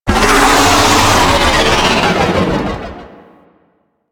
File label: Baby Dragon Vocal Death; Enemies; Warframe; Duviri; Void Dragon